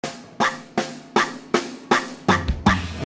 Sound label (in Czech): zpev